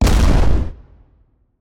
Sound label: Special Hit; Lola